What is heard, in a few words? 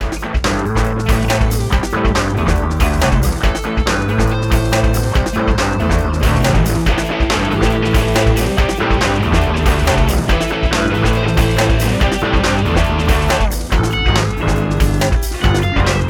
Breakdown Rock